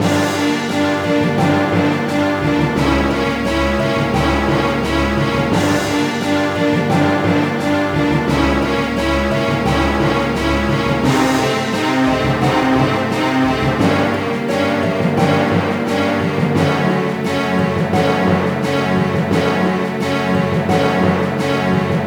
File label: Dungeon; legend of the tetrarchs android ios ps4 xbox one gamerip 2018